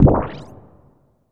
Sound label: Bubbling Boing